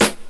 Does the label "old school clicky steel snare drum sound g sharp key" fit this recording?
Yes